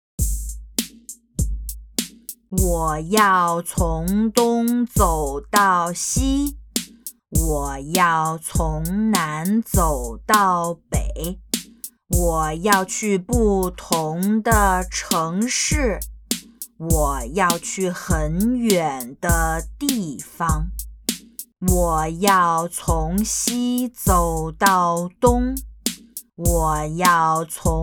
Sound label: Chant